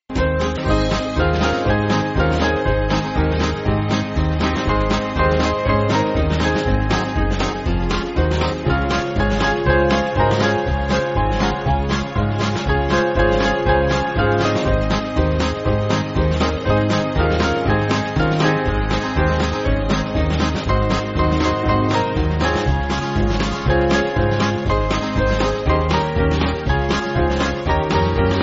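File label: Band, Music Backing Files for Churches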